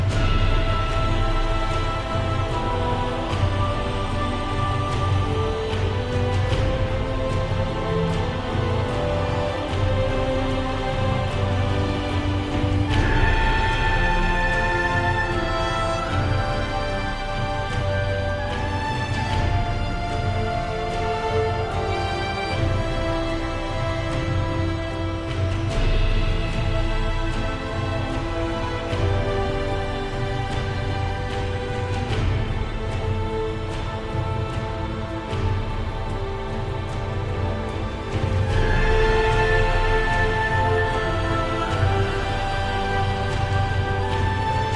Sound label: victory loop; LoL; howlingabyss